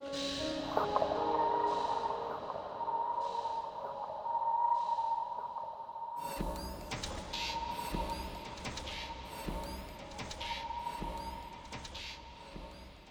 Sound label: BGM Lobby; metal mind; xbox series xs